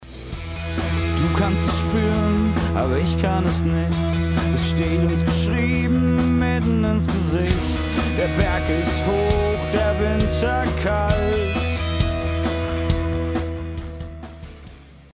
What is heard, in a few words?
Vocals Distressor